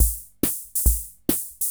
140 POPS

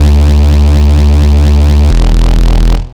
Dagga Destructor Bass, breakcore

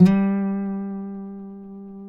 FINGER, Guitars; TAKA

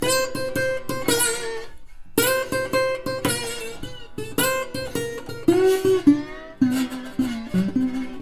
120, Blues Guitar; ILIO - Fretworks

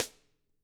CAMCO SNR; ILIO; Double Platinum Drums 1

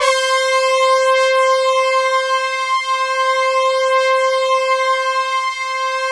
BRASS, PADS; Masterbits; Vintage Voltage

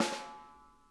snare, drum kits

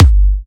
Electro Kicks

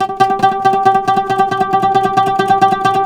PLK OUD, Roland LA Composer

